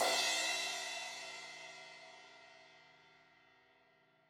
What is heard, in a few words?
Crash 16in edge, Cymbals